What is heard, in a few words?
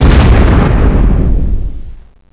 med, impact explosion; SINDEMO